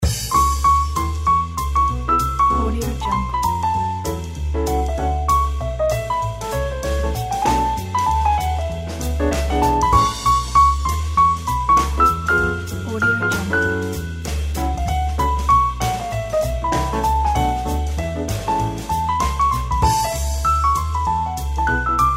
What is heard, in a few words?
upbeat jazz piano